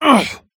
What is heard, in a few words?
Environment Sandstorm